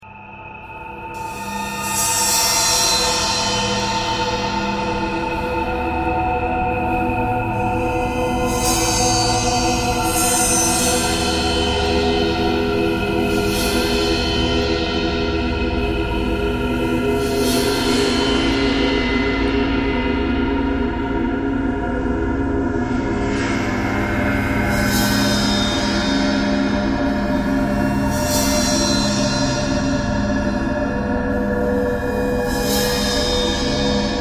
ice bowed cymbal traffic kcore birds a thread pentatonic; bowedmetal